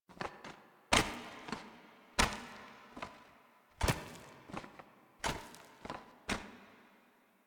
Foley Walk; Cinematics; Teshin; Warframe; The War Within; Mountain Pass

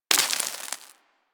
Bullet Impact Debris Dirt; Warframe; Weapons

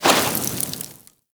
Sword Elemental Fire Whoosh; Enemies; Weapons; Warframe; Teshin

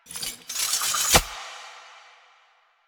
Idle Sword Twirl; Ambience; Arthur Hub; Warframe; Nineteen Ninety Nine

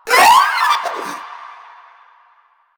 Vocal Short Attack; Warframe; Proto Infested; Runner

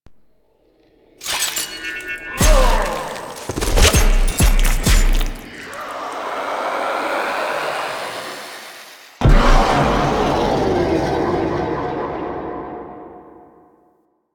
Knife Attack; Enemies; Memory Of Betrayal Knife Attack; DE; Warframe; Warframes; Pax Duviricus

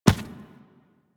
Infested Medium Run Default, footsteps; Warframe

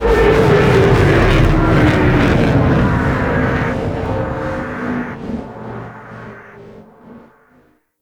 Drop Ship Engine Loop Stop; Warframe; Corpus

